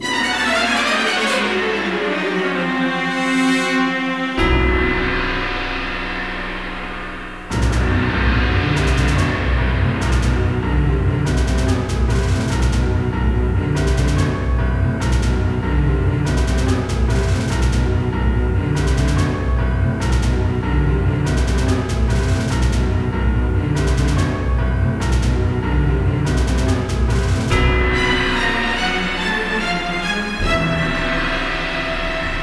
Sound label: bgm panic; eve bgm panic; Concerto Gate